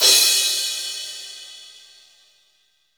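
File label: cymbal crash; Sound & Vision - Gigapack I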